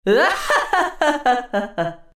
laugh, zh; Legcom; Dota 2

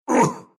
pain, ru; dota2

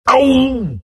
pain; tusk

